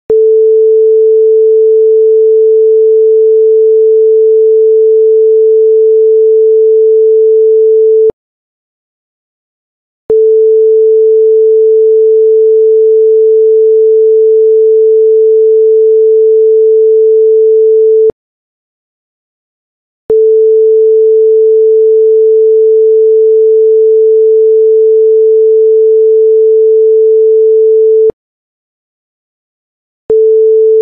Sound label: A 440 Hz